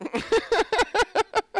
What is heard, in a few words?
High pitched song like male